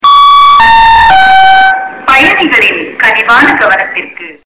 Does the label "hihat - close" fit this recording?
No